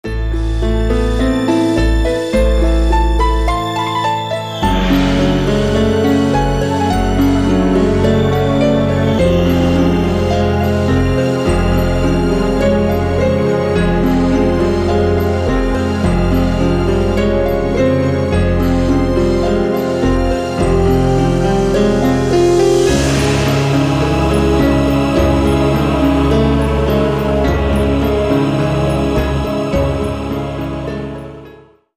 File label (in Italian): horror tales